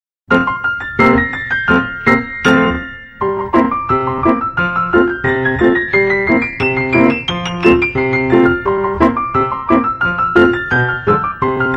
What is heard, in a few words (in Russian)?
упражнение Подскок с остановкой